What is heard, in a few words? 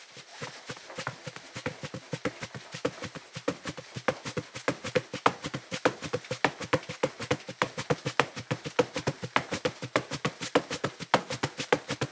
Running Barefoot Carpet